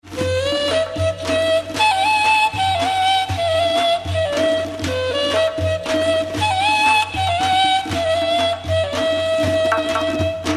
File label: Ney